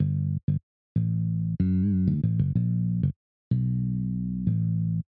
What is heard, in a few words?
bass sa before